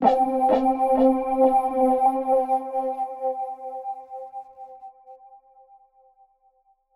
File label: Keys C; Trap; One Shot; HipHop; Melody Kits; 130 Bm; Ghosthack; Ghosthack - AC2024 - Day 5 - Melody Kits; Flowers